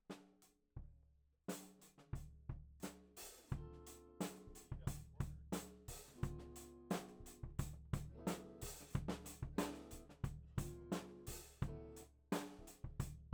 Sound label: Floor